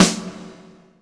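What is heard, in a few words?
REV SNARE; Greytsounds; Scott Peers Sound Engineering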